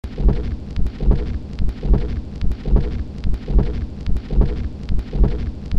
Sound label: off kilter 7 inch loops; Halluciphile